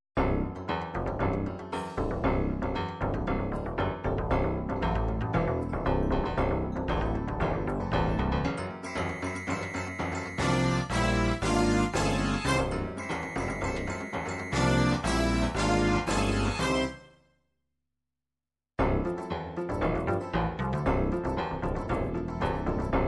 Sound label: funk fusion, midi